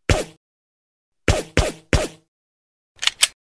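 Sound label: silenced pistol shot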